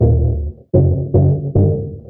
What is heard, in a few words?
bass, Loops